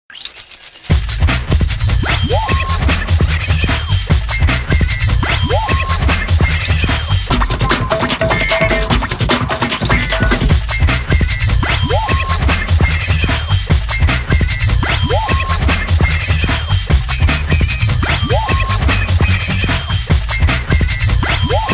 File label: Digi Scratch Remix